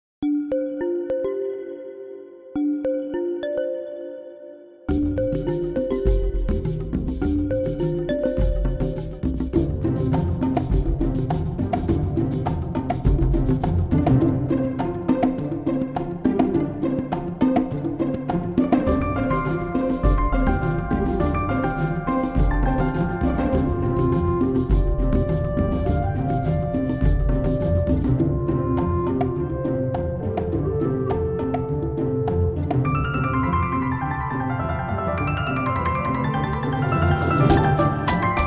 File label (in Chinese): 背景音乐; 3分以内